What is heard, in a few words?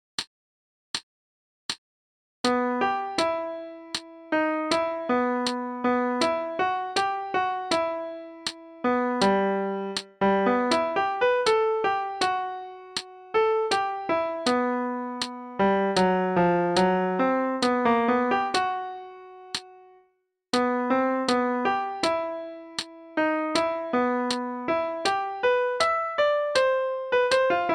alto sax etude; all state